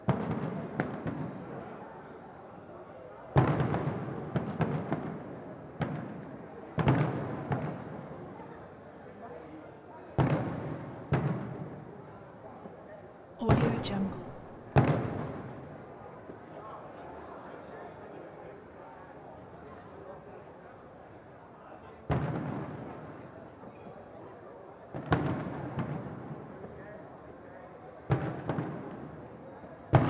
city centre distant fireworks

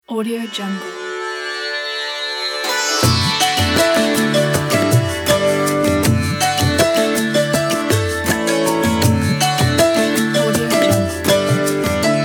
bollywood upbeat

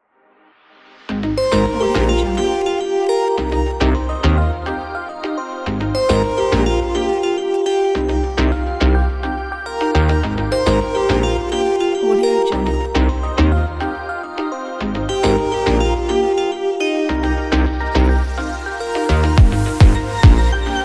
tropical ray

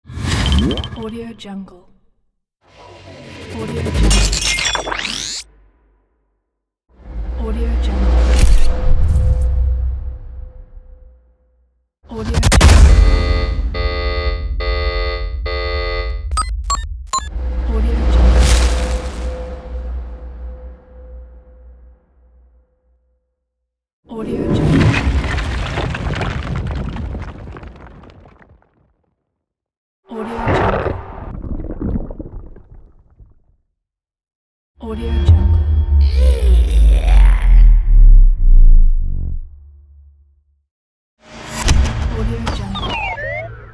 in swoosh

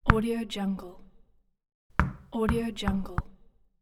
tennis ball

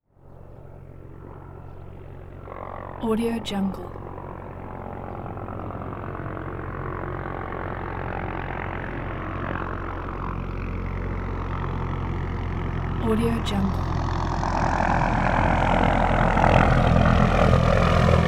notar helicopter exterior in hover land; prosoundfx

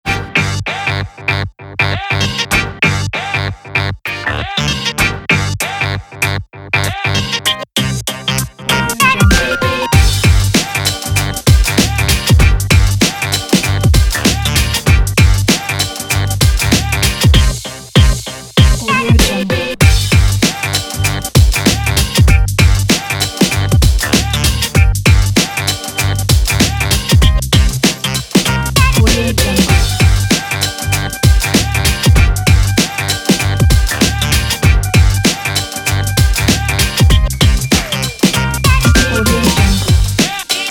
hiphop party

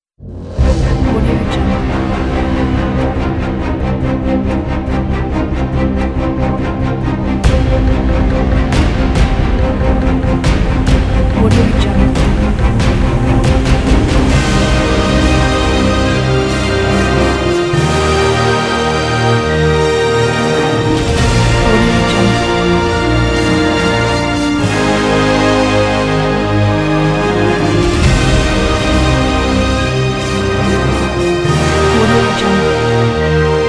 blockbuster trailer

